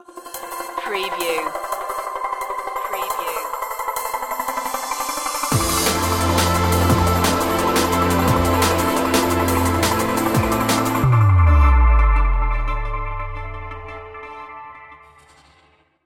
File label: drum n space stinger